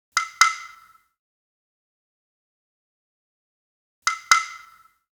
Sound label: Perc loop, Big EDM Drum Loops 123 BPM; Future House; Future House Tools